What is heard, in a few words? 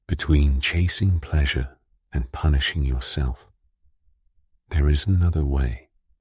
drop, remix